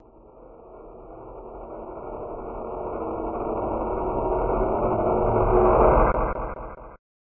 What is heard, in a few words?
fade fx; depression